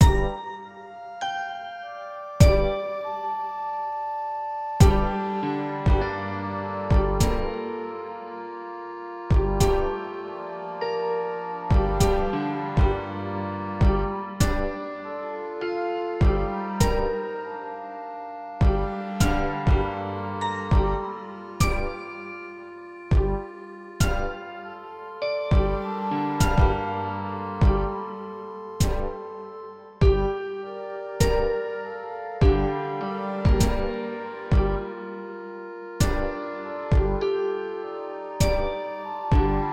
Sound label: happy minor